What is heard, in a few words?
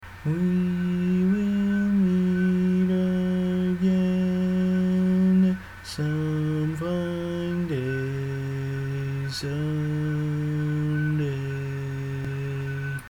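Baritone, barbershop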